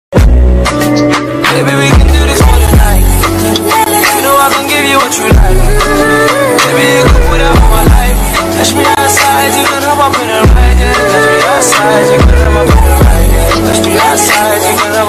bgm ringtone tamil love ringtone new tamil x english ringtone